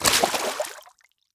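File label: water run, footstep; fst water; skyrim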